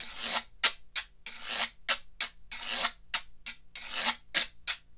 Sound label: guiro, Synclavier Percussion, World & Orchestral; ILIO; Synclavier Percussion 2